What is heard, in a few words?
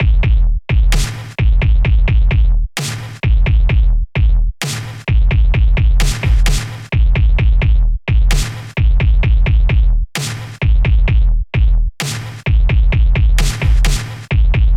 Kick Snare Loop